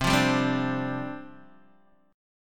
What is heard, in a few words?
C Suspended 2nd Suspended 4th, down, Guitar, Open D